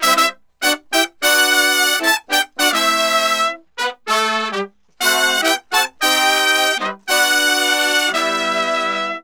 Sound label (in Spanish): Brass ST Afinados; con Melodyne